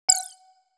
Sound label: beep